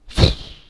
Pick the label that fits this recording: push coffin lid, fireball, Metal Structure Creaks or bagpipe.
fireball